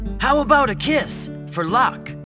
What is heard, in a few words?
kiss